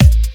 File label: Bassdrum; Best Service; House Definition VOL-1